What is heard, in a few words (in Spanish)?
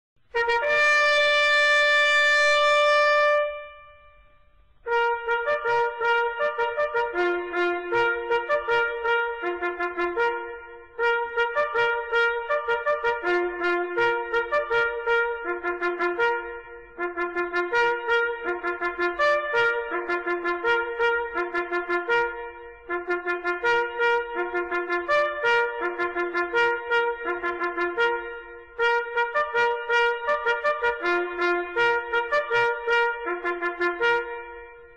CORNETA diana hora levantarse